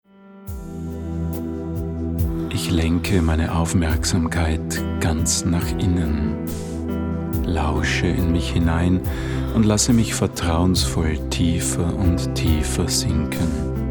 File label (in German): musik